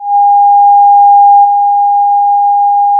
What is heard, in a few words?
pop, Sound of a cruise ship horn